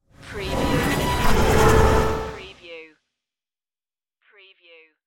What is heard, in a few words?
flutter wobble whoosh